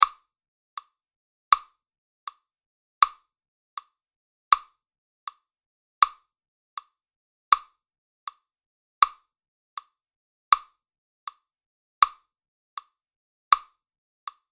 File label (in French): rythme 80bpm